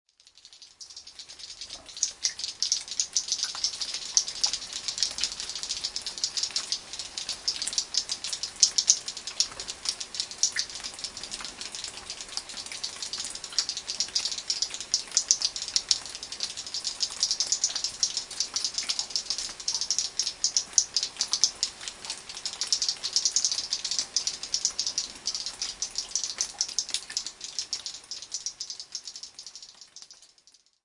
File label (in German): salanganen